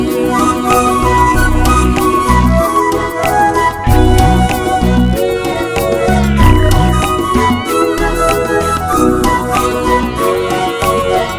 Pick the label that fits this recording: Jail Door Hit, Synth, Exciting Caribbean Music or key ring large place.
Exciting Caribbean Music